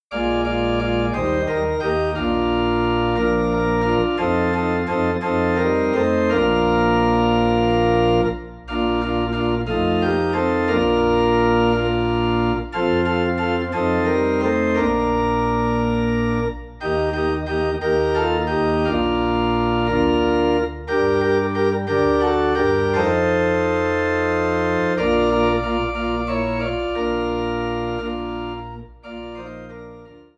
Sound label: Organ in B Flat; There Shall Be Showers of Blessing